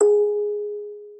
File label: musicbox